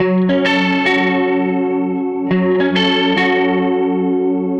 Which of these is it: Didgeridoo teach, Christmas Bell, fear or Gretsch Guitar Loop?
Gretsch Guitar Loop